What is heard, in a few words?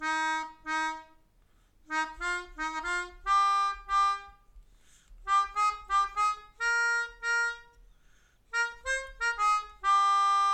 Melodica